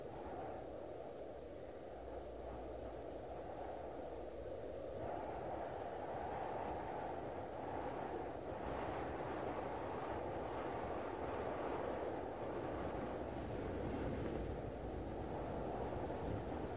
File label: Nature Sounds for Sleep